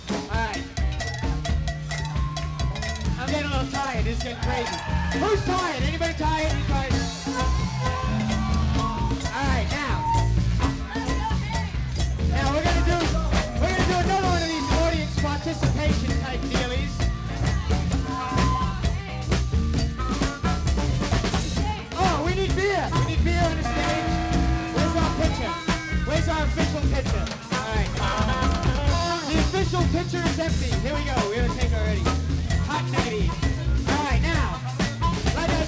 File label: Harp Solo